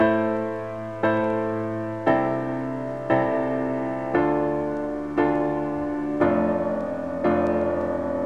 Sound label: piano, Sad